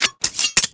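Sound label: draw, butterfly knife; weapons; cstrike; csgo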